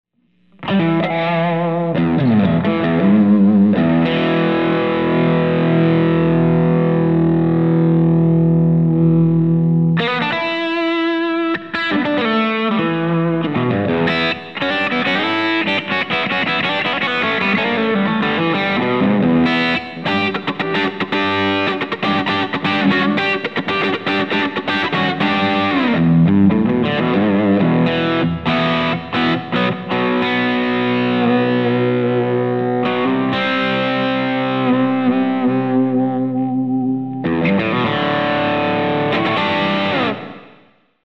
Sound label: BD2 Half Gain